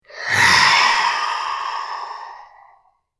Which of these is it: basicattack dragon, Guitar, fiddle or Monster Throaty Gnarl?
Monster Throaty Gnarl